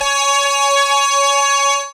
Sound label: synth glide, dance synth; Sound & Vision - Gigapack I CD 2 (Roland)